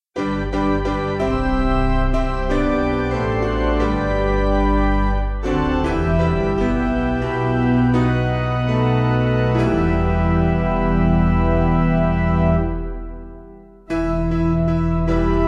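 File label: Organ Piano; Caneuon Ffydd; Alice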